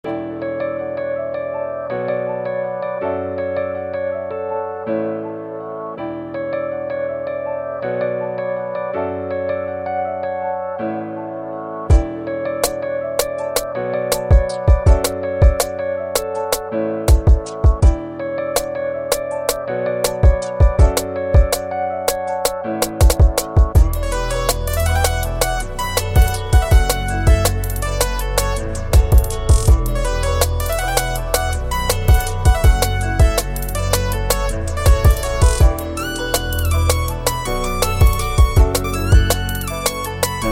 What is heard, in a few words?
Retro Rap